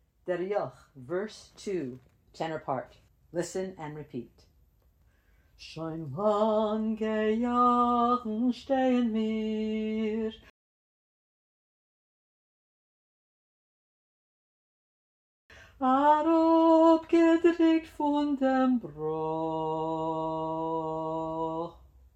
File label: verse tenor drill; Listen and repeat; Der Yokh